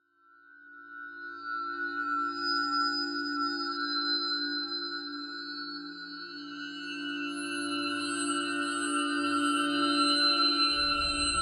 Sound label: KICK